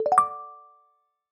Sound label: simple celebration; Hero Sounds; Material Design